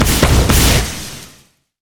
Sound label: pirate cannon barrage; LoL; gangplank